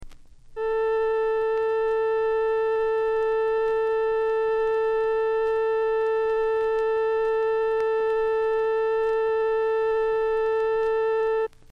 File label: Tuning, Electric Bass; Slap It! - Funk Studies For The Electric Bass